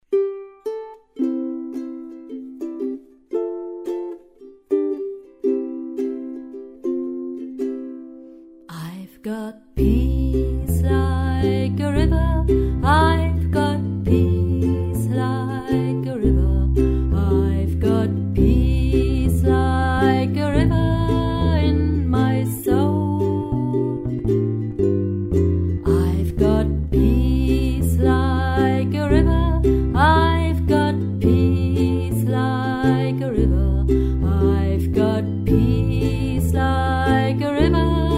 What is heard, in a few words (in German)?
Peace like a river mit u-bass; Spirituals; mit Ukulelen-Bass; Jemuku: Jedem Menschen eine Ukulele, Band 2